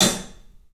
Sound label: club hat; 3D Sound; 3D Drums